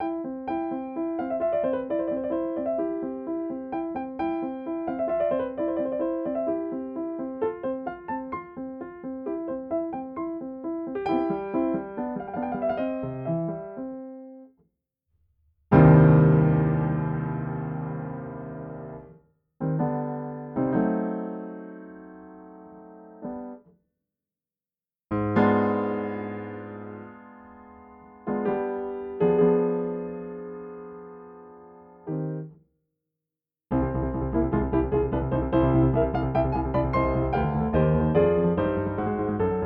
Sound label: My Steinway